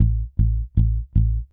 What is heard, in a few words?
Stacatto Eigth Notes, Bass; Bass X